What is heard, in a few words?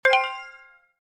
confirm